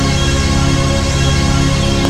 HIT + STRINGS; Kid Nepro - Elite

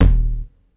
Tom, Ensoniq ASR-X Pro

